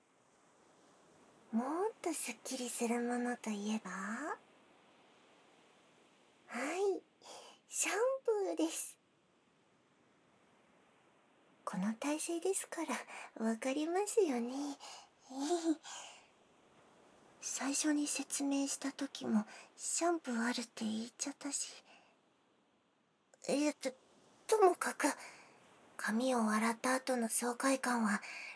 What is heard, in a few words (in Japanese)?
シャンプー ハンドマッサージ; ヒーリングサロンシエル; すっきりコース